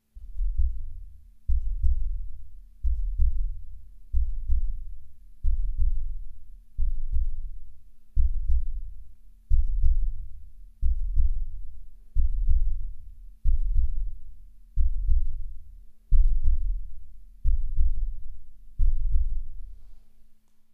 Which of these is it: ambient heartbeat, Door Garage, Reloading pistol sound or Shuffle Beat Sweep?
ambient heartbeat